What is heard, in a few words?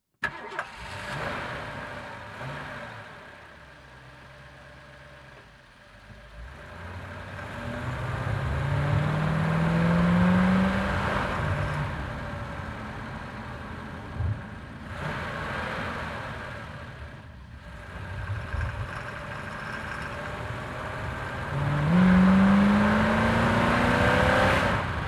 Mustang Mach1 ONBOARD drive fast - engine, 1970 Ford Mustang v8; aXLsound